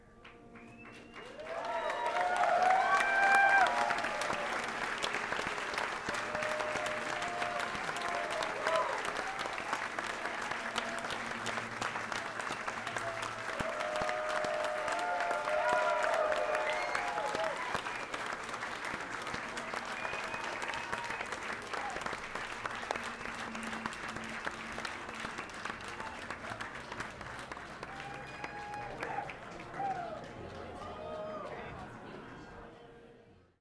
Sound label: applause; The Chapel; San Francisco